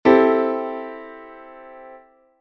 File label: chord